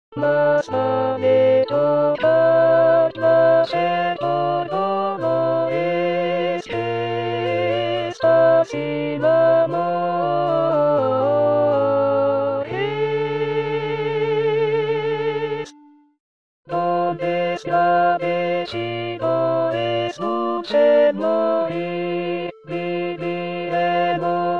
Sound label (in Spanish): mas vale trocar A voz